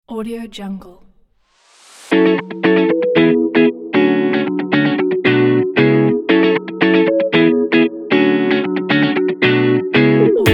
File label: funk groove retro pop; grapesong